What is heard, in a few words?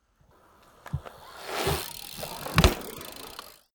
bmx maneuver ramp jump land